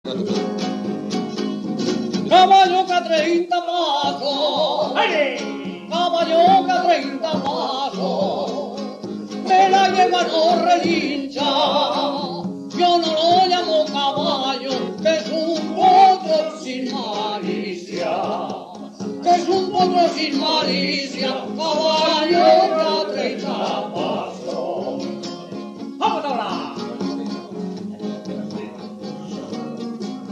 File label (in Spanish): Jota Solista; Bada Caballo que a treinta pasos